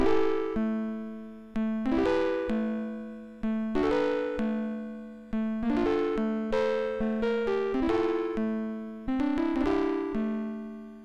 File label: Broken Piano Roll live, sid MOS6581R3